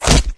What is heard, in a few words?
rifle swing hit survivor; Left 4 Dead 2